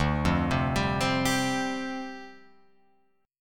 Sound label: D Minor 11th D down Guitar Open F; Dm11 arpeggiated